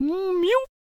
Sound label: Chariot Attack Vocals